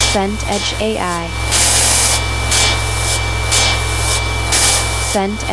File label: scifi corridor hum